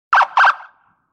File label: blip